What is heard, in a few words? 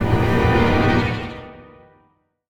Chord; Megafy Chord2; super crazy rhythm castle